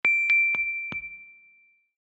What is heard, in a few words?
Soft Chime, notifications; Samsung; Galaxy Watch 6